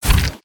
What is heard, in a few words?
walk; creatures organic